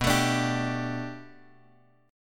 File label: B Minor 7th Sharp 5th B Bass Standard, chord; 19,24,24,24; Bm7#5 strummed; JGuitar